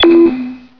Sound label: pod attacked